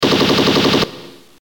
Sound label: Ric gun